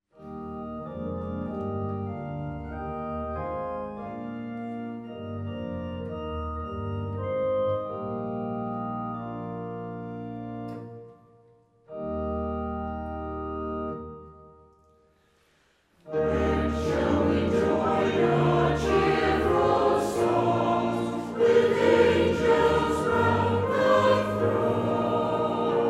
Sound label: Choir, hymns